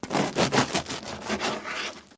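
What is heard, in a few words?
Cut Slice Bread Single; utensils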